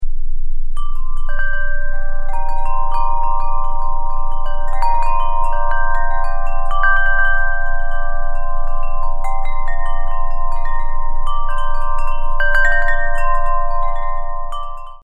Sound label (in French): carillon grand terre noir